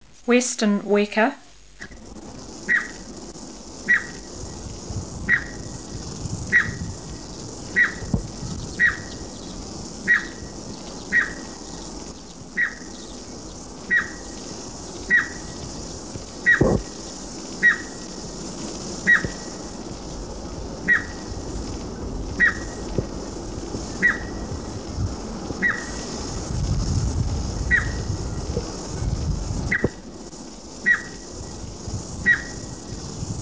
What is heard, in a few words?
Western Weka